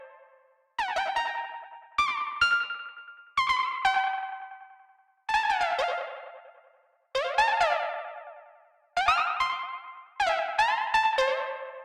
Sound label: Frog A Raintune; Proteus